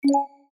warrning; Oppo Neo 5

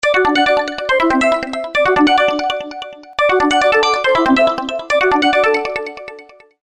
Ringtone, Samsung GT-C3110

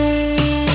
video end; Brionvega; N7010

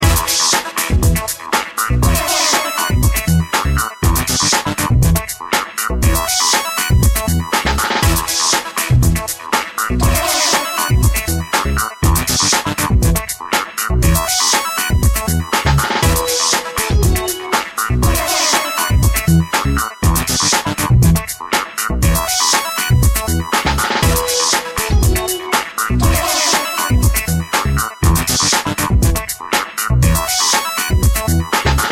More polite, ringtones

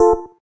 gayageum, keytone

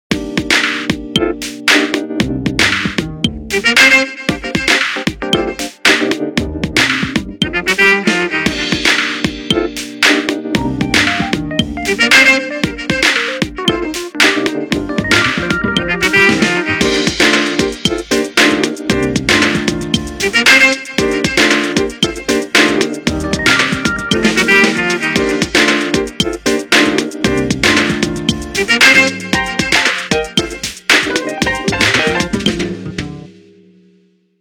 Jazzide, Ringtones; Sony Ericsson W980

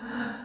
ui proximity wake, Motorola ROKR EM35; ui sounds

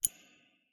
Lock, notifications; Yota Yotaphone-2

